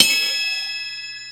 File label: Little Bell; KJ Sawka; Drum n Bass & Beyond; Drum Tool Box